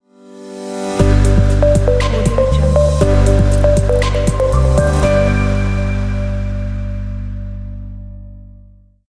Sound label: innovate tech logo